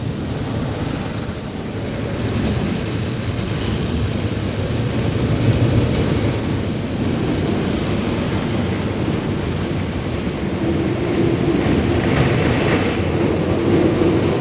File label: car wash; best service Blue Box